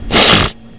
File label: sniff